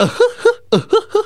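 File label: short male laugh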